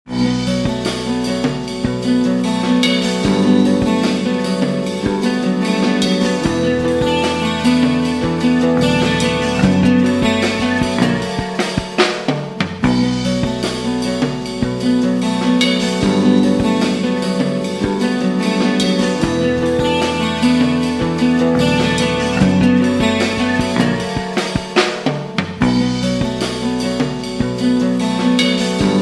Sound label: riff ringtone